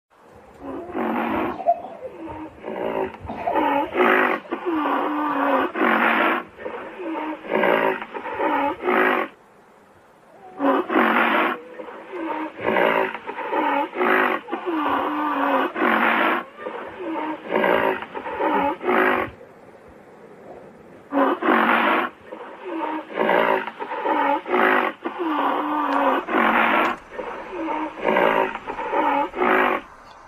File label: Rhineroceros